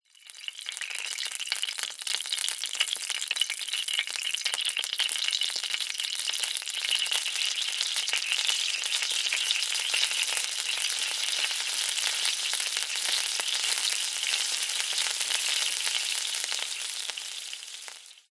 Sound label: food cooking oil mixed with water hot pan sizzle pop; zapsplat